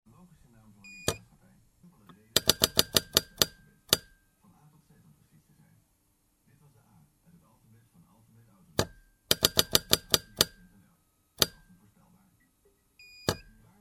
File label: clicks; fieldrecs